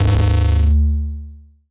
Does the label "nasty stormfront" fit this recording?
Yes